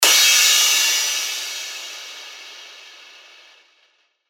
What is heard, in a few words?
Crash, cymbals; revealed cymbals vol 1